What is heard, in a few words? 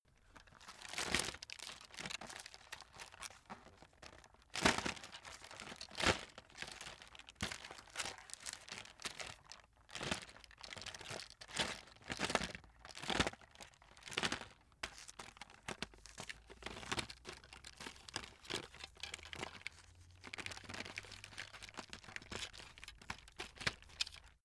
chocolate box handling